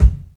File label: phat steel kick drum sample b key, kick single hit tuned to the B note; kick drum samples